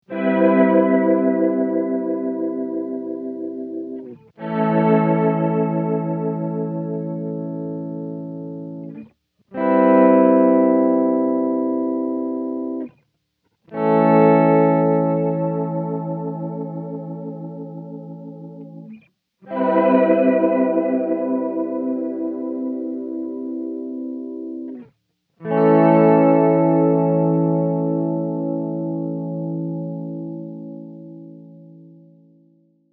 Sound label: auto swell, mobius